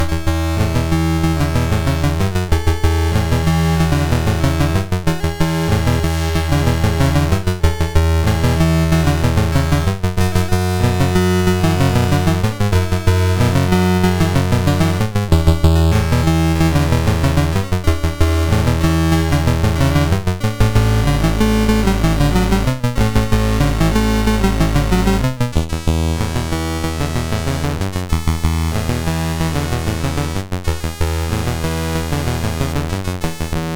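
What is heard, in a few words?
Magicians Ball, MOS 6581 SID chip music, games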